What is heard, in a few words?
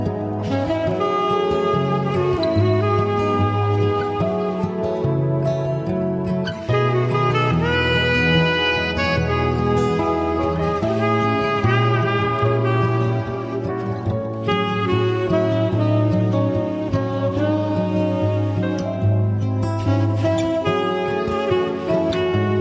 unknown melody; classical